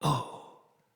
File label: Death, Battle Barks; Envoy